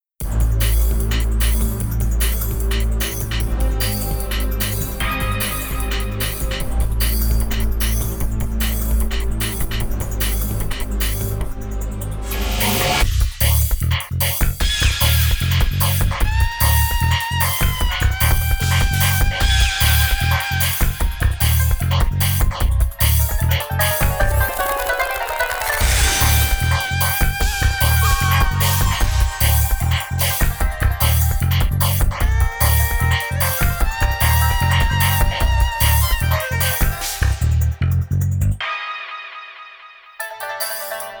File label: Chinese Style; Sweet Laboratory